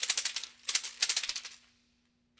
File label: Seed Pods, Ethnic Percussion; Sonic Foundry (Sony Creative Software); Ambient Atmospheres & Rhythms